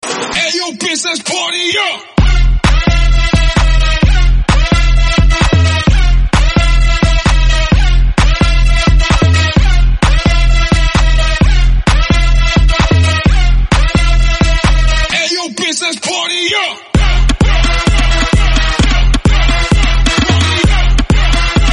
BASSHOUSE MASHUP, 130BPM

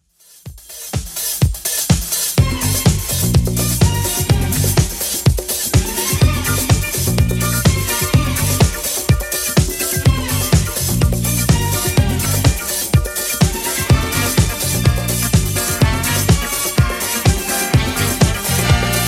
70s Xtend Edit 125bpm

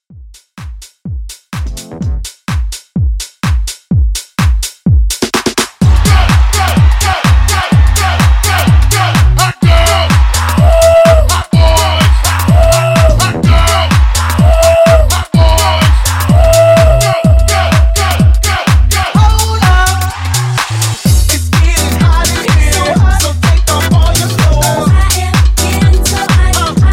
Club Reboot 126 CLEAN

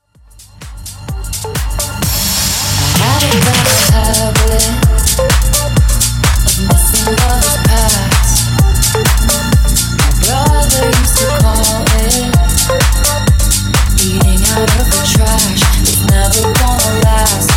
Club Extended Mix, 128Bpm

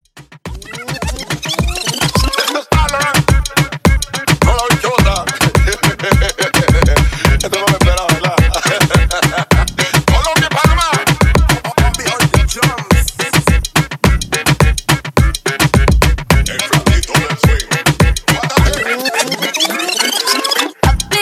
Dance Hall Ext In Outro Latin 106 BPM

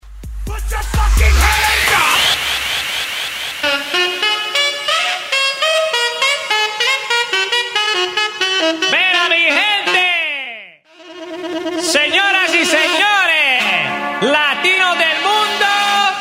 Latino Intro 128bpm

